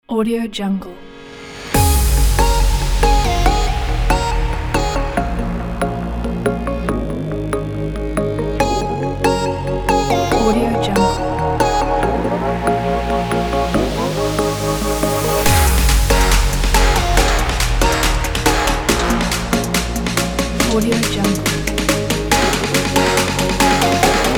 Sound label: uplifting future bass